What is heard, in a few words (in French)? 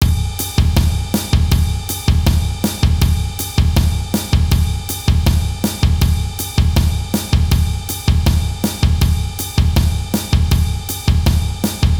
Samba intermediaire